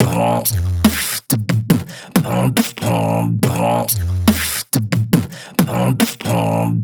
Beat Box Drum Loop; aawaz

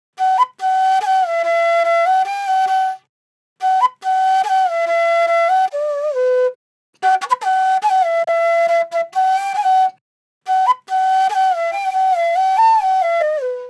Drill Flute Loop BPM 140 B Min

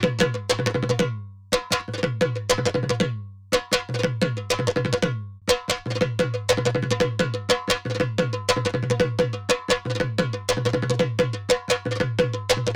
Mahor Dhul Loop BPM 120; Bihu; waves of bihu vol 2; Gully Loops

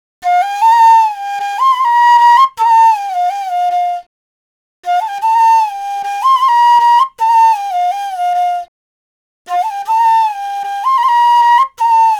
EDM Flute Loop 78 BPM F

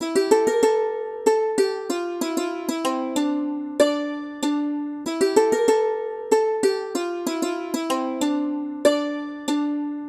Melody Loop BPM 95 A Min; Gully Loops

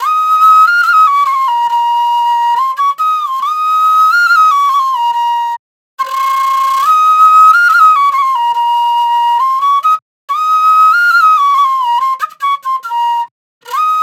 Trap Flute Loop BPM 140 A

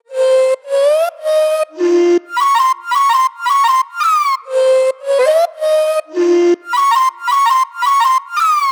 Melody Loop BPM 110 A Min; Gully Loops; Mahi

